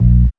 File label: BASS; E-Lab; X Static Goldmine 1